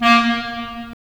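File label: wind clarinet; Roland - LA Composer vol. 3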